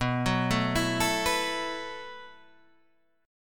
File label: E Suspended 2nd Suspended 4th B